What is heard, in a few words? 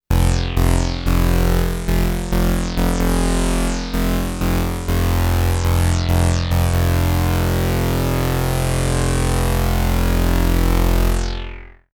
OBXa Bass